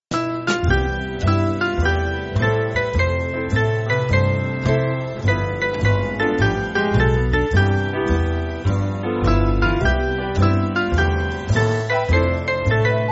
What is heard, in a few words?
Band